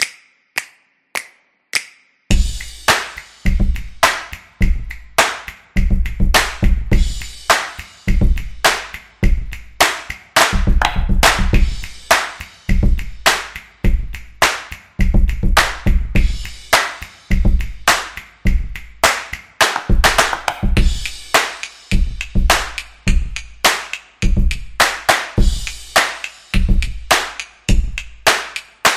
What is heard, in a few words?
Claps Drumming; percussive nation vol 1